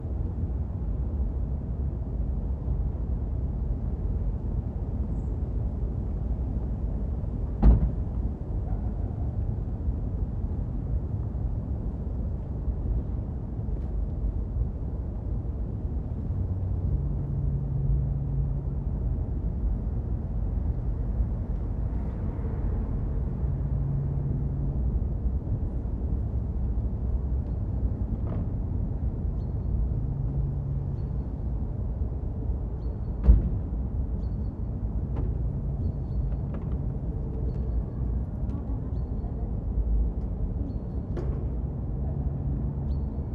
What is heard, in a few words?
Ambience; Rengkok